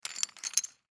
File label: Poker Chips Clay, Poker Chips Clay Short Settle Felt Table; Casinos; Sports; Blastwave